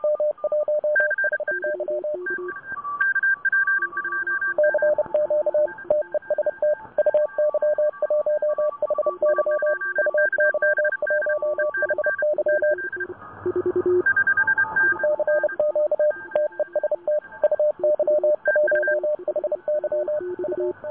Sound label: CW recording 7004.8kHz; Websdr